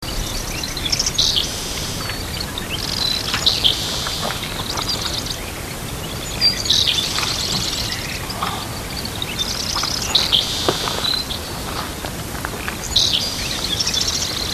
Seaside Sparrow; Dorchester Co MD

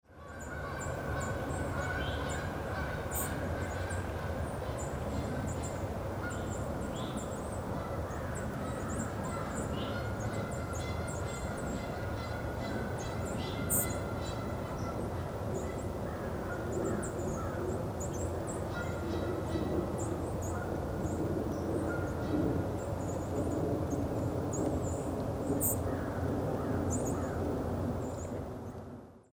Lincolns Sparrow, MD

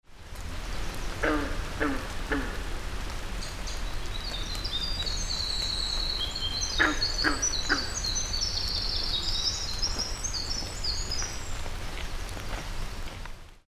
Winter Wren; 1000 Acre ME

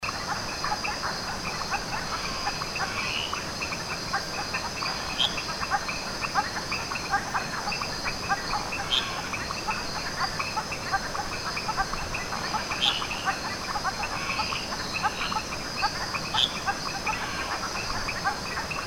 Frog Chorus; Canopy Lodge

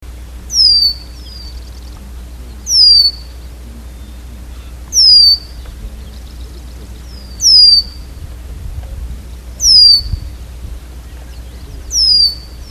Red-winged Blackbird Alarm Calls; Dorchester MD

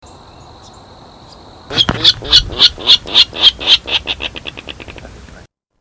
Virginia Rail Loud Grunts

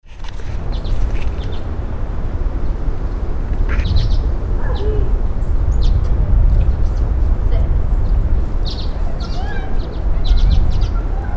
Common Redpolls; Ft Howard BA MD

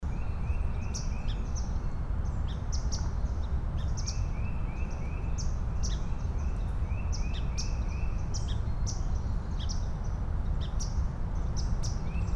Swamp Sparrow Chipping

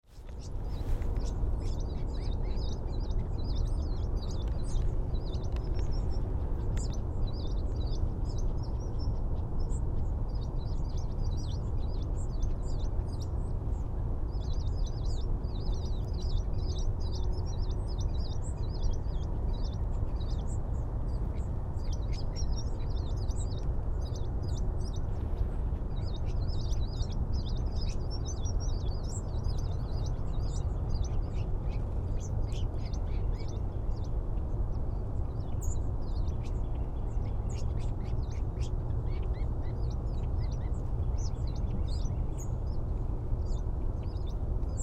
American Tree Sparrow; Harford MD

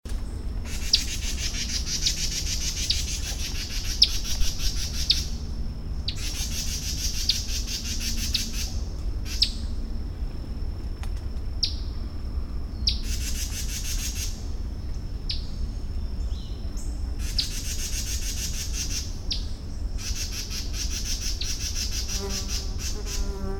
Kentucky Warbler chipping; Caroline MD

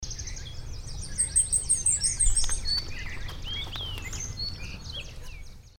Blackpoll Warbler, MD; Finzel MD

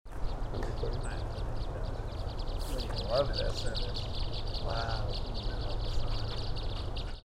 Red Crossbill Type 3; Taylors MD

